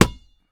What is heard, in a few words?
Street Ball Slap Ball Block; SFX Kit